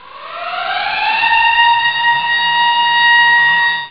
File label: Siren, Amiga Soundtracker; Amiga Soundtracker Sample Packs